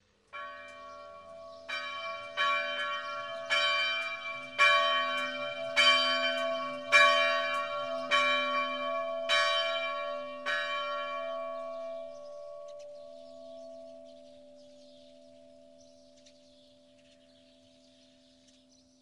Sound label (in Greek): ΚΑΜΠΑΝΕΣ